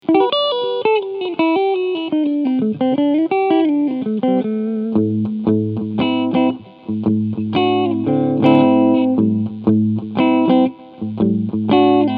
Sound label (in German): Epiphone B.B. King Lucille Clean beide PUs